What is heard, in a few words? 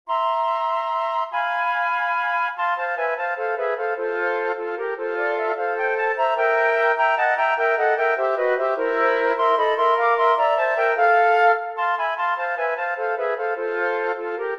Mexican Hat Dance